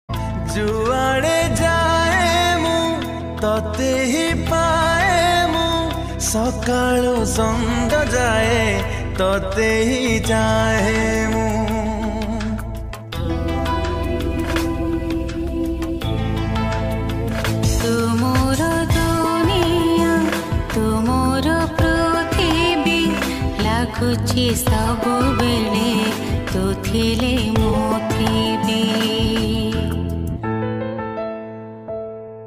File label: Odia Song Ringtone